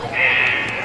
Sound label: RIGHT PANNED ALARM